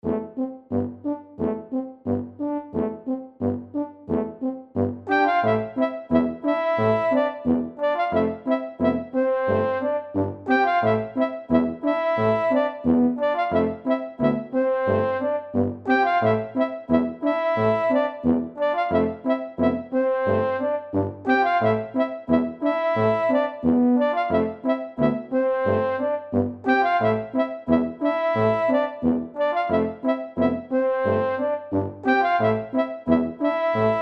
HIPPO FANFARE

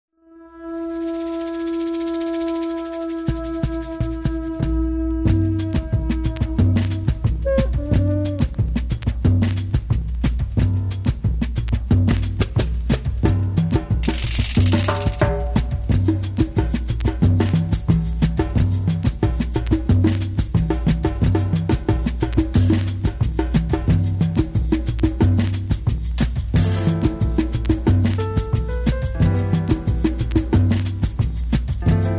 BOSSA COCO

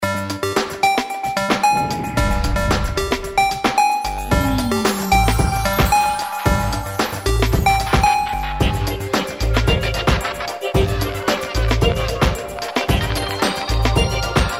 GENERIC ELECTRO RED

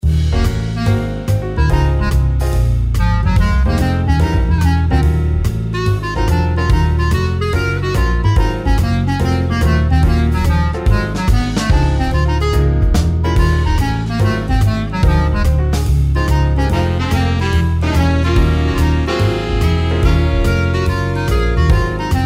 JAZZ SESSION; BIARRITZ

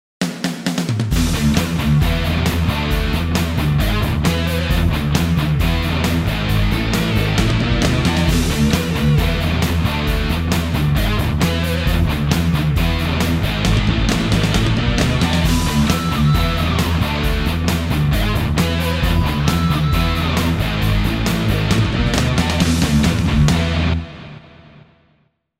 TRANSITION POKER

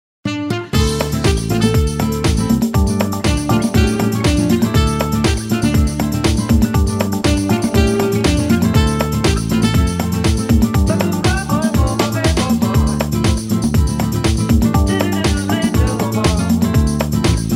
JAZZ FUNK

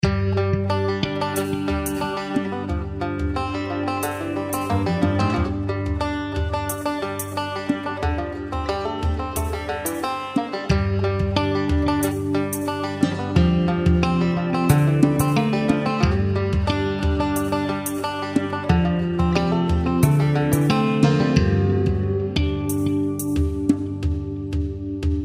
BANJO AIRY GREEN

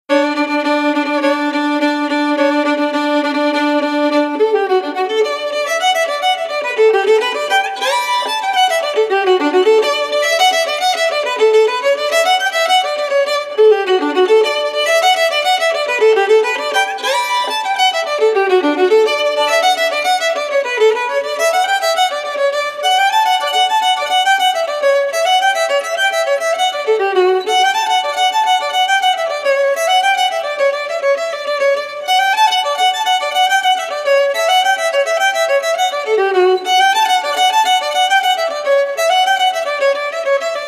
KENTUCKY BLUE GRASS

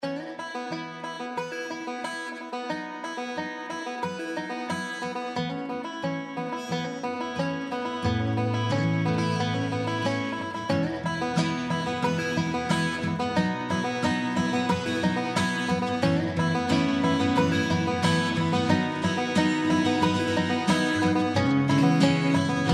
BANJO COUNTRY COLOR

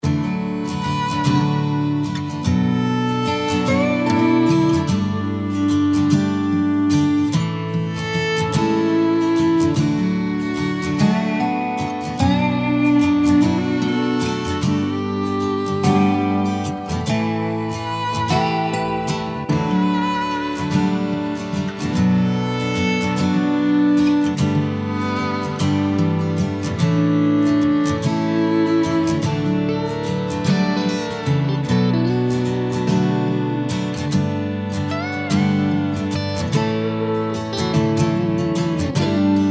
COUNTRY CUTTING BALLAD

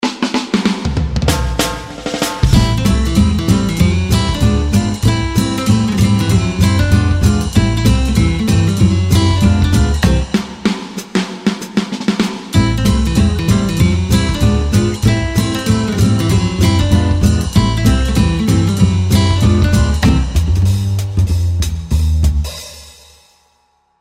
JANGO SWING

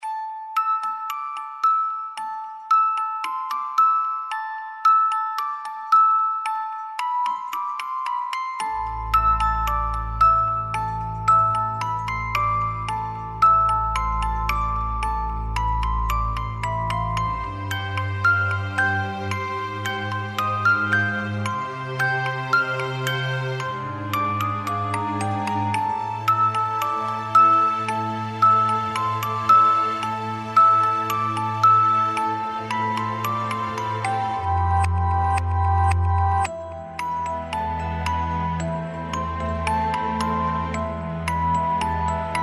ORCHESTRAL MUSIC BOX

